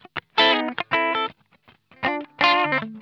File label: BLUE, Funk Guitar; Zero G - Funk Guitar